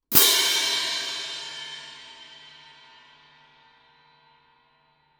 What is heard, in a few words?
orch crash viennese ff, Crash Cymbals; Percussion